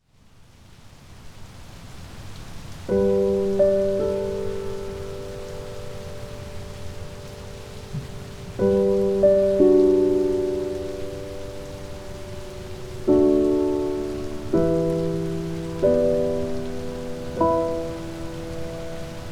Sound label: Piano, Piano & Rain